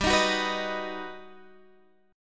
G Augmented Major 7th

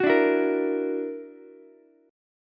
F sharp Augmented 7th F sharp Ukulele; F#+7 strummed

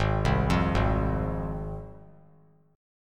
G sharp 6th G sharp down, chord

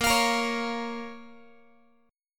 A sharp Minor A sharp down Guitar; strummed; JGuitar

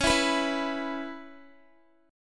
C sharp Minor 7th C sharp Guitar; C#m7 strummed

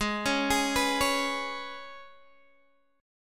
G sharp Minor Double Flat 5th, Guitar Open D; 18,16,18,17,16,x

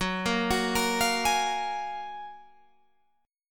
Gb Major 9th, down, Guitar, Open G

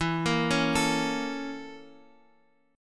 Bb Minor Major 7th Flat 5th, E

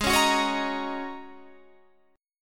Ab 9th Suspended 4th Ab down Guitar Standard; Ab9sus4 strummed; JGuitar

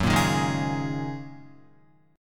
F sharp 6th Add 9th F sharp Guitar; F#6add9 strummed

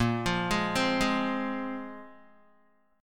A sharp 7th Suspended 2nd, Guitar; A#7sus2 arpeggiated; JGuitar

